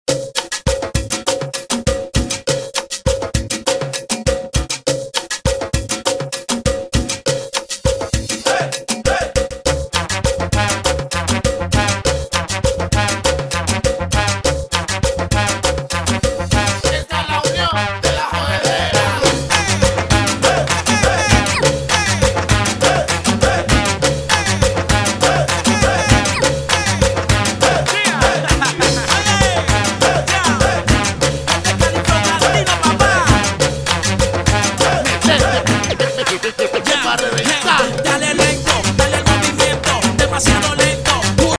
remix bpm 100; (Intro); Prodeejay Remix

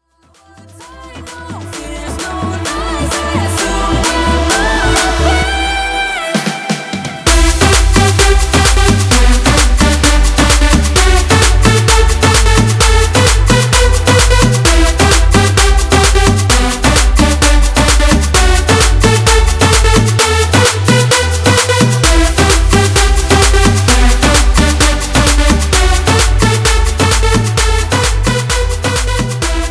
golden tribal remix 130 bpm

